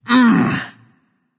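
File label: nonverbal ugh; smite; voicepack; merlin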